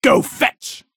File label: Drone Mine